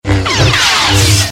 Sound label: clash